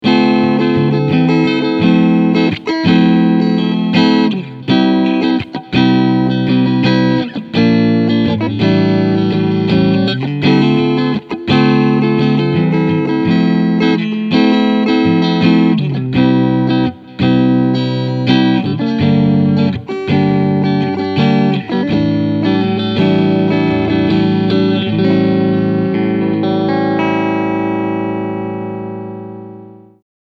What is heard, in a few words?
Front Mitte Clean